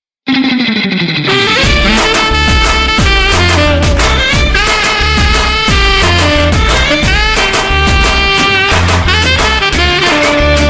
(Instrumental); Life Ki Toh Lag Gayi

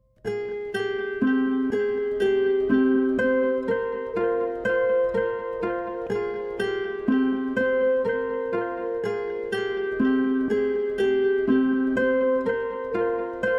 Their Names (Instrumental)

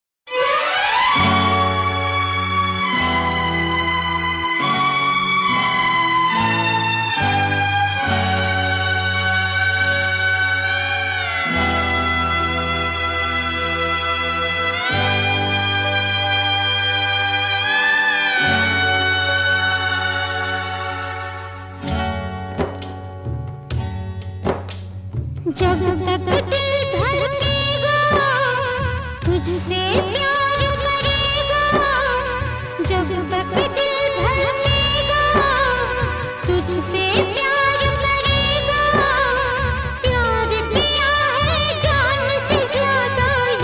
(Sad); Kasam Vardi Kee

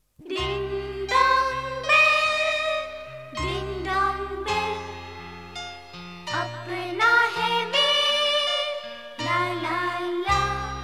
Ding Dong Bell; Chandra Mukhi

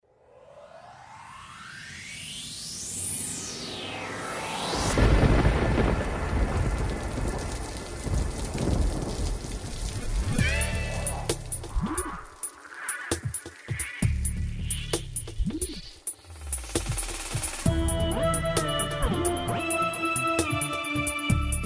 Female Version; Rain

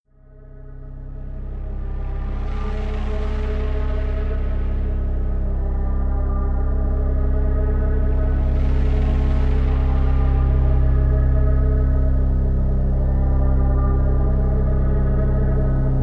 Ten Steps from the Factory (Instrumental)

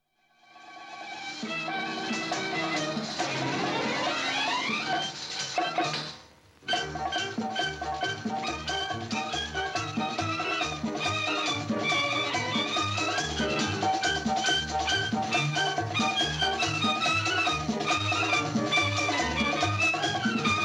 Dance Music; Zabak

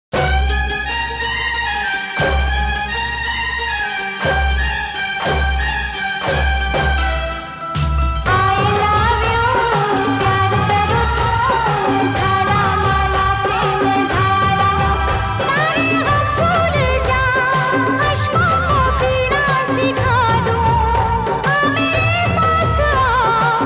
Duet; Maha-Sangram

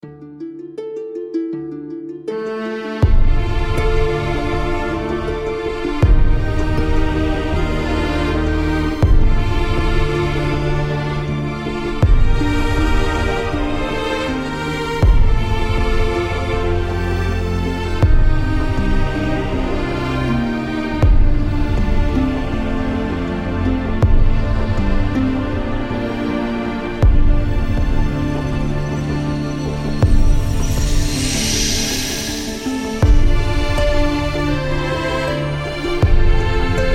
Instrumental Version

